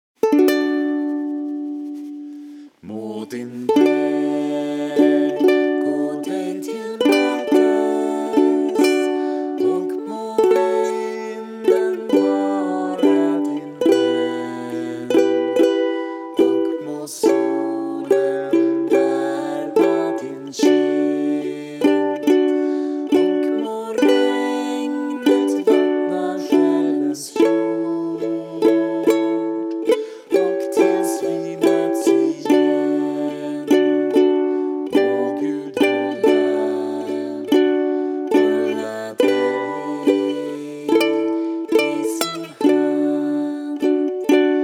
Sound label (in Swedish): Må din väg m ukulele